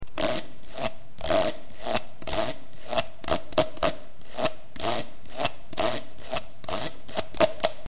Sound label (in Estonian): kõrrepill